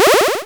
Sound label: power Up